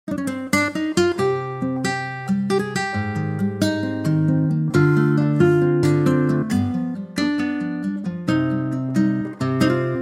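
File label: Cm latin guitar; key - Cm; Stunning acoustic guitar arrangement, with a gorgeous latin feel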